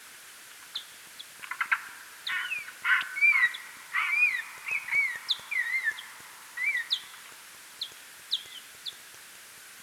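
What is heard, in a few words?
Eptesicus serotinus, bat; Boehm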